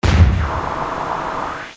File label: deep blind trap hit